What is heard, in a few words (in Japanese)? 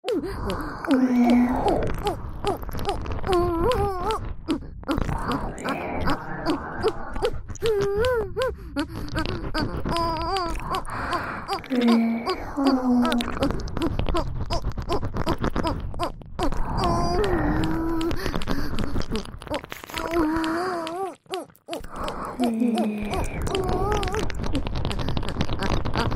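オホ喘ぎ＋ぐぽぐぽシンクロ耳舐め; 3WAYループ; SE有; おまけループ（オナサポ）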